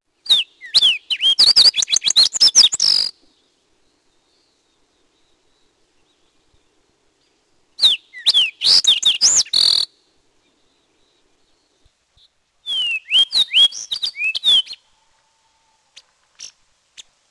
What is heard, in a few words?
fox sparrow